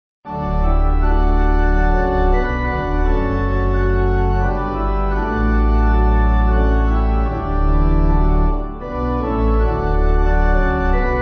O Come Little Children, Pipe; Temple Fortune

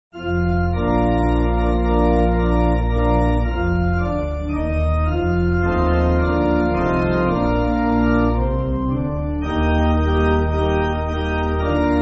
Pipe Organ, Backing Files for Churches; A Call For Loyal Soldiers

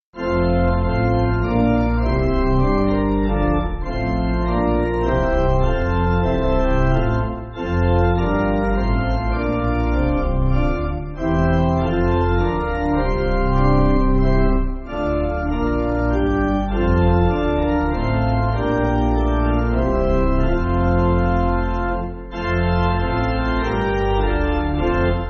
Pipe, church music; Evening And Morning; Gerhardt

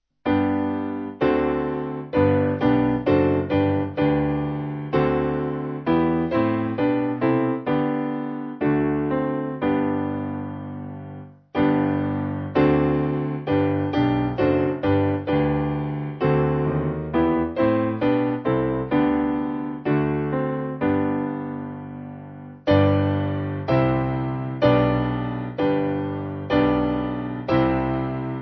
Piano, Music Backing Files for Churches; Master Speak; Magister

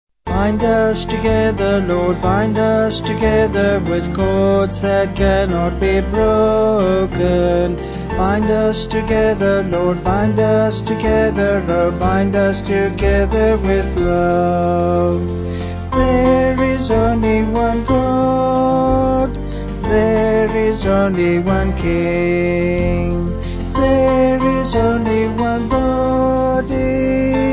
Vocals Band

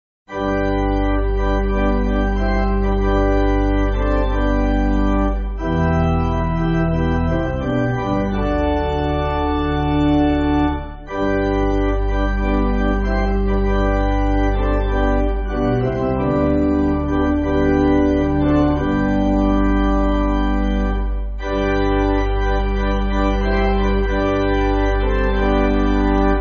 Pipe, church music; Come To Me; Coffin

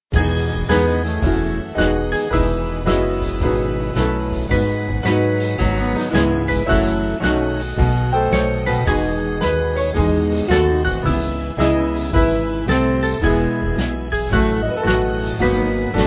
Band; African American; Swing Band

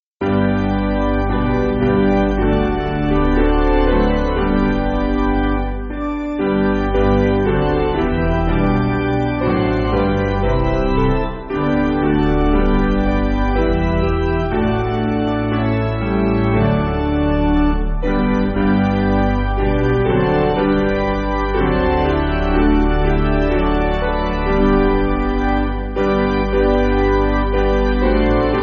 Lord Thou Has Won Piano

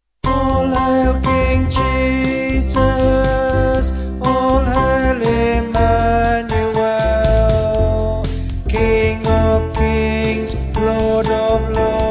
Vocals Band

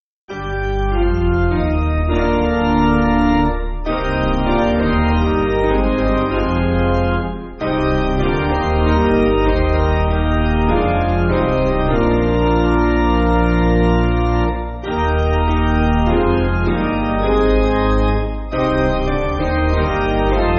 Piano, church music

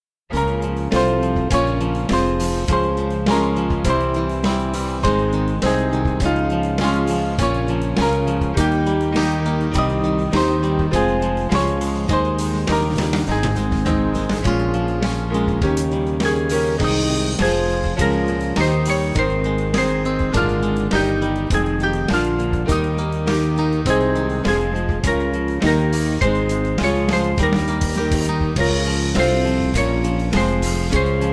Far Off We Need, Band; Fulneck

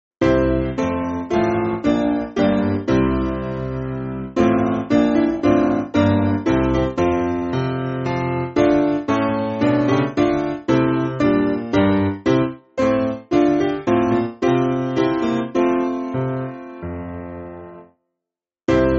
Piano, church music; How Beauteous Are; Day Of Praise

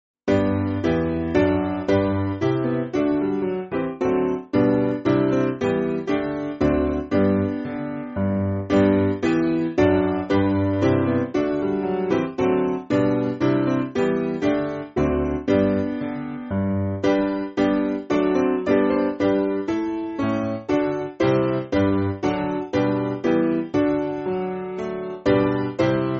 Woodbird, Piano; O Day Of Rest

